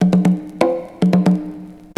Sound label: CONGA 120